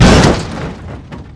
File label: crash; Mafie 1